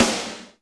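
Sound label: GATE snare; Crypton; DrumBase5000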